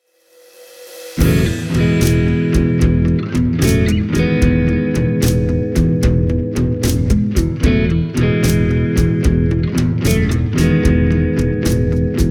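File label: jazzcaster, guitar; j leachim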